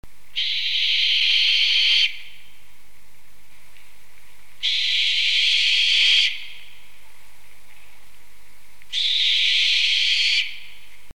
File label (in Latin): tyto tenebricosa